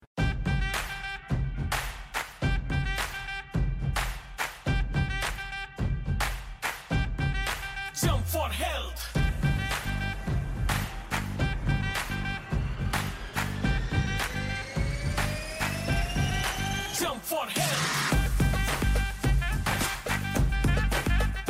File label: jump for health music; active together; healthinsurance